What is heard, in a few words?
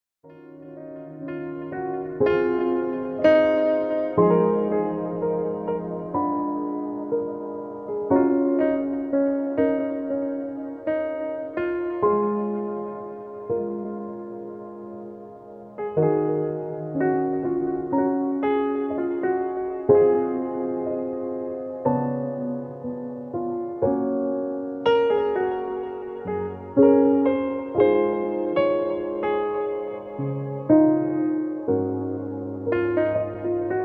Soothing Music Sleep Time